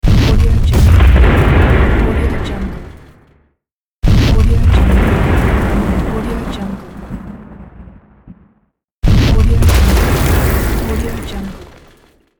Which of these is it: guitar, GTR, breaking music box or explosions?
explosions